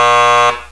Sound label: WRONG SND